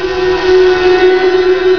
BUZZ

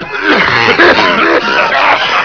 COFF SMOK; PEOPLE

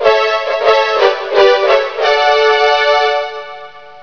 FANFARE

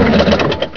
CAR STOP; TRANSPOR

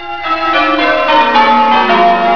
BELL PEAL; ALARMS

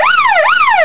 SIREN WOW; ALARMS

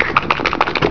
GOBBLER; ANIMALS

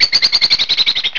BIRD

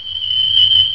flux hi; EFFECTS

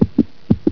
heart

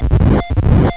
flux warn; EFFECTS

